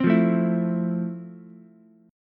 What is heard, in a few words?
F Major 7th F, Ukulele; strummed; JGuitar